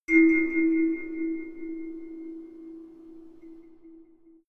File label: melodic odd tine bell high